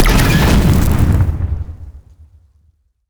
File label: explo gas can; weapons; Half Life Alyx